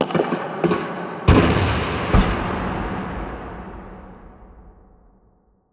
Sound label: city battle; War Ambience